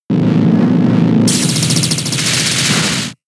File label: Payback; Pokemon; gen 6; XY; ORAS